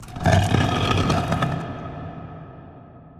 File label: Bonus Intro Animation; genesis; clash Of Queens